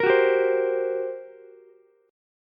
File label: G Minor Major 7th Double Flat 5th, G, Ukulele; strummed; JGuitar